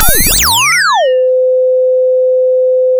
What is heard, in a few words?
pop, sound of a bike bell